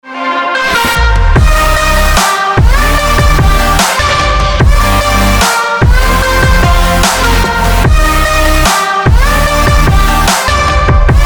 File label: beautiful bass; DubStep